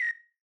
Feed Discovered, sound scheme; Longhorn 7ista Sound Scheme by David Mounder

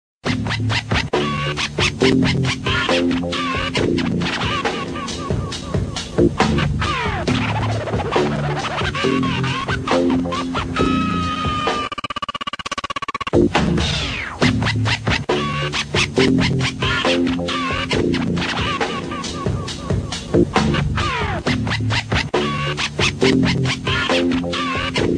Scratching

